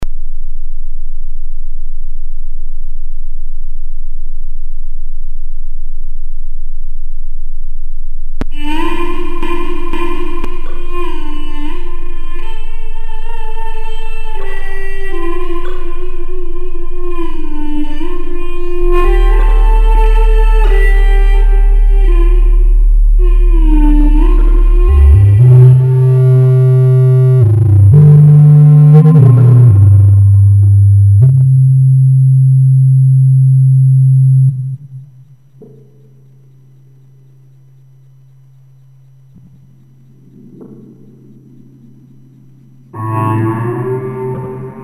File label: morning sea birds